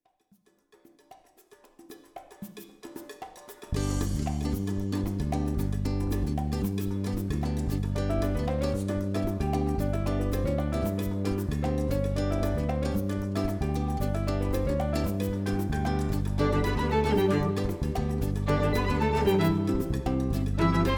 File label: Jingle bells